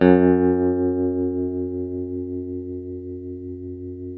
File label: TELE TREM G, Guitars, TREMO; Spectrasonics; Hans Zimmer Guitars VOL-1; TREMO-LUXURY